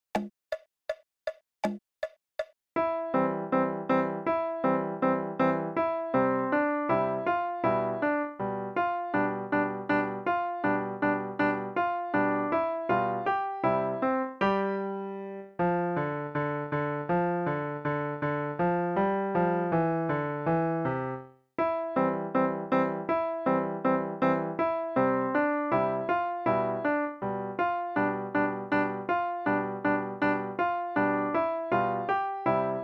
Bullock Cart

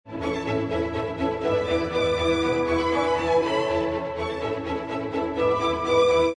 Mozart Clarinet Concerto, intervals 7

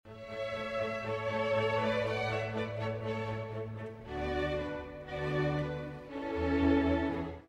Clarinet, intervals Min; Mozart Clarinet

